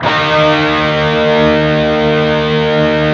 PRS PWR CHRD E 3 L, Guitars

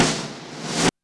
REV SNARE; Back In Time Records; Big Bang

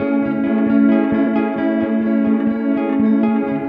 Tapeloopgtr, Guitartronics; Sonic Foundry (Sony Creative Software); Ma Ja Le Ethereal Textures

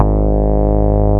BAS Mini; Best Service Gigapack 2

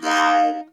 NOTE BOX, Funk Guitar; 90 s Sample CDs; Zero G

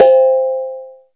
kenong; SN-R8-07 Mallet

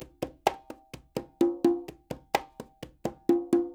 NYC Percussionworks loops; Sampleheads

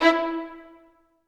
E3, Orchestra; AKAI Advance Orchestra Upgrade 97 Vol.1

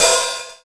SPD-8, DIGITAL

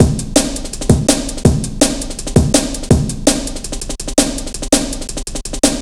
165, drum loops, drum & bass; Total Drum&Bass

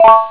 take noise; ST-XX Amiga Soundtracker Sample Packs

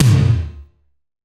Tom, Gated Reverb; creative gate; musicradar

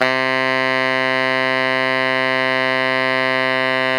TENOR FF C 3, saxophone; Greytsounds; Philip Wolfe David Crozier's Saxophones

